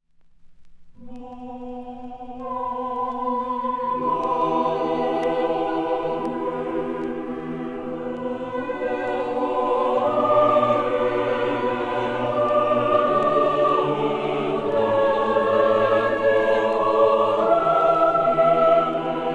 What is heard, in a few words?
Psalmi poenitentiales (Atgailos psalmes); O. di Lasso